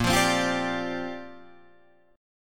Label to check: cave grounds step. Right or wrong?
Wrong